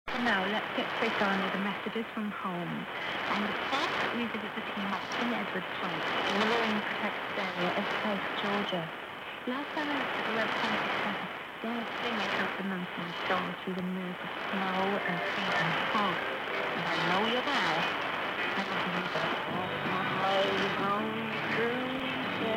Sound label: MID-WINTER 13810 kHz